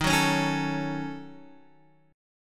Eb Minor 7th Flat 5th, Guitar Open D

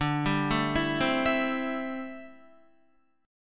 D 7th Suspended 2nd Suspended 4th D, Guitar; D7sus2sus4; arpeggiated

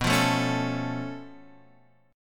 C sharp Augmented 7th B, chord

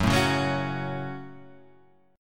Gb Minor Gb Guitar Half Step Down

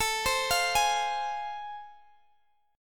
A Minor Major 7th Sharp 5th A, Guitar, Standard; arpeggiated; AmM7#5; x,x,19,17,18,16; JGuitar

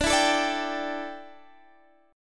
A 7th Suspended 4th D

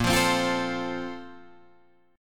A sharp Major 7th Suspended 2nd, Guitar, Drop D

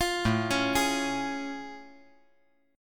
A Augmented Major 7th A

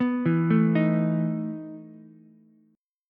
E Major 7th Suspended 4th, Ukulele; arpeggiated; JGuitar

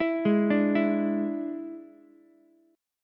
D Suspended 2nd Flat 5th down Ukulele; arpeggiated

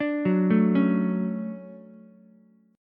D-Major 7th, D, Ukulele; arpeggiated

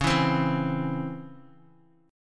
Db Minor Major 7th Db Guitar; DbmM7 strummed; JGuitar

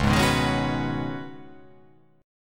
C sharp 9th Suspended 4th C sharp down, chord

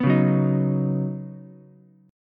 Db 9th Suspended 4th Db down Ukulele C6; strummed; JGuitar

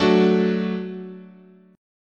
F sharp Minor Sharp 5th, guitar chord

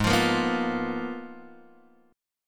Ab Minor 6th Add 9th, Ab, Guitar, Open G

